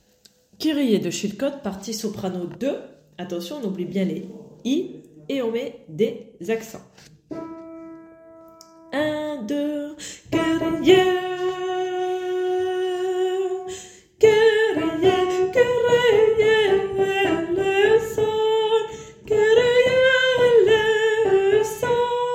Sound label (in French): soprano; Kyrie